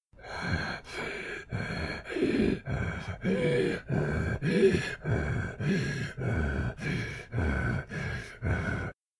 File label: Cultist Seek Breath